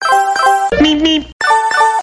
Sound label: twitter message